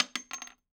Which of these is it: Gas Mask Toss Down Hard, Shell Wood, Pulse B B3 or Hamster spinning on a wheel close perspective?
Shell Wood